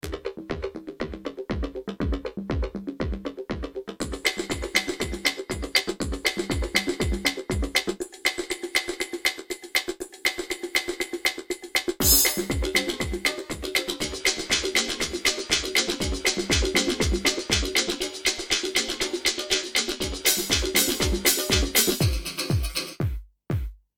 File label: drums